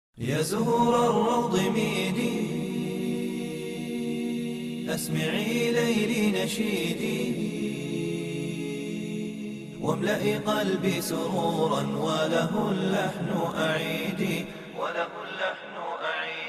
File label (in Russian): красивый нашид, beautiful nasheed